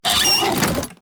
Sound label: chest close; Daz3D; Furniture